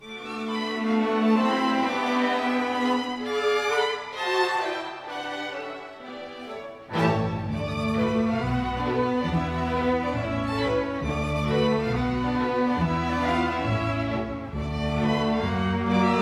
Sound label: Jazz Legato